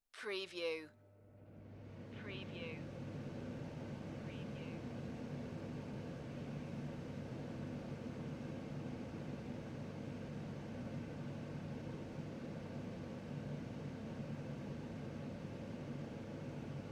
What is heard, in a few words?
Kitchen Extractor Fan; beanstalk audio